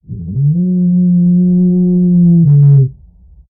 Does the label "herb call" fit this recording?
Yes